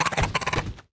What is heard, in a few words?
GUARD WAR WALK; FLEM; Mob